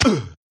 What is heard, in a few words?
player hit; soldiersfury